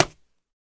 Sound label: Boxing Glove Hit Slap